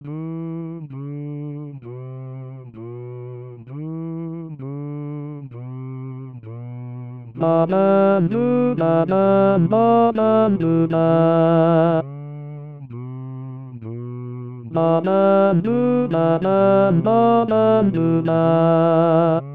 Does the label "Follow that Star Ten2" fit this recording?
Yes